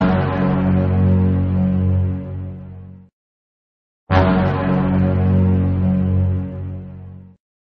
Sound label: suspense